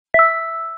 text receive

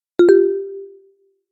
text send; Sound design for the opera composer: concepts and methods; Vicky and Albert audio cues

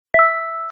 text receive

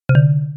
text send; Sound design for the opera composer: concepts and methods; Vicky and Albert audio cues

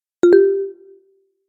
text send; Sound design for the opera composer: concepts and methods; Vicky and Albert audio cues

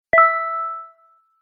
text receive

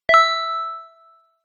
text receive; Sound design for the opera composer: concepts and methods; Vicky and Albert audio cues

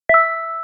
text receive

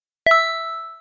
message receive; Sound design for the opera composer: concepts and methods; Vicky and Albert audio cues

